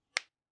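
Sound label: cracking fingers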